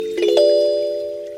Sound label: lg stylo 5 notification ringtone